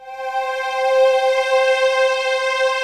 D70 SPACE DRM; Rubber Chicken Software Sample Bank

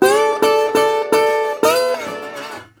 120, Blues Guitar; ILIO - Fretworks

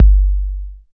808 KICK; East West - Phat & Phunky CD1; 90's Sample CDs

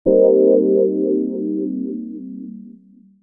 Digi Pads; Jungle Frenzy 1

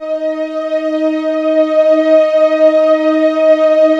PROPHET VS, Vintage Keyboards; Big Fish Audio - First Call Vintage Keyboards

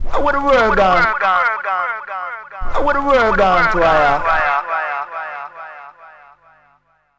Rag Vox, Vocal FX; Zero-G Smoke

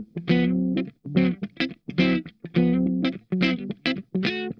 STOP START, Funk Guitar; Zero G - Funk Guitar

